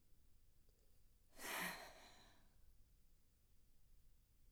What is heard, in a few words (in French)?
soupir; Index des soupirs 26 – Hadjithomas Joreige